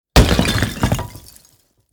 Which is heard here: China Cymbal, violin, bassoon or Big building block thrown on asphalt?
Big building block thrown on asphalt